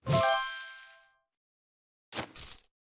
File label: treasure picked